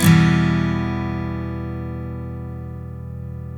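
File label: steel E minor up, CHORDS STEEL, Guitars; Spectrasonics; Hans Zimmer Guitars VOL-1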